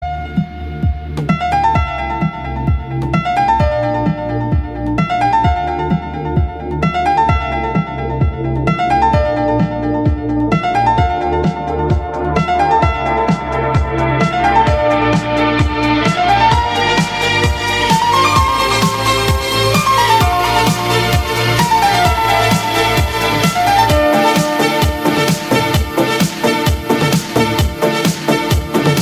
Gold Trance Mix

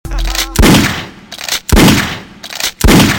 Gun Shots

